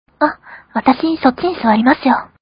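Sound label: guitar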